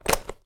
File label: household mains plug uk type plug into wall socket